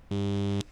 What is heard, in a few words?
Cardboard glot100 unfiltered